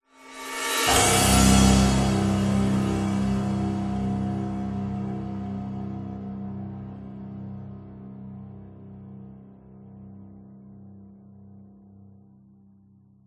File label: cinematic stab orchestral hit dramatic cymbal piano strings; sound design